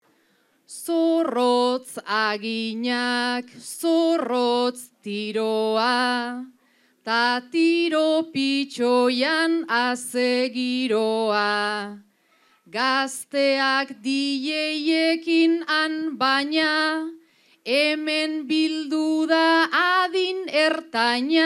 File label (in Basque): agurra, bertsoa; eibar arrate